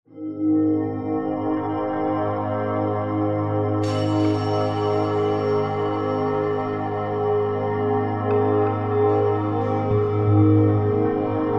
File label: 741Hz; PROBLEMLOeSUNG SELBSTAUSDRUCK